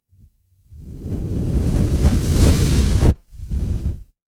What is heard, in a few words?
fire electric whoosh flame